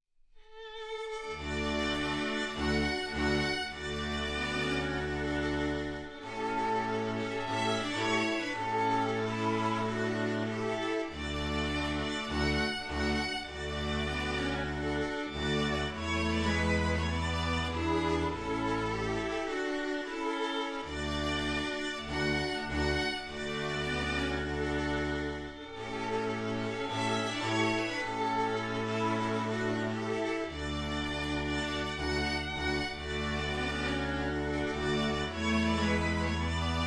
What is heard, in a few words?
Sync Wavetable Soni